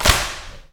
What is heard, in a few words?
kick